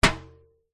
impacts metal thin foil baking tray hit knock bash; zapsplat